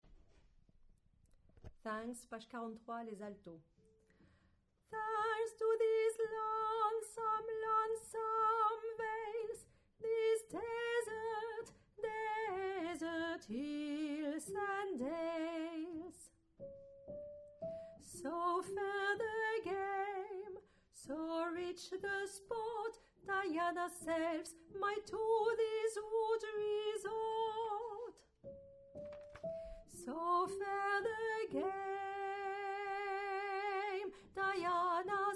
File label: Alto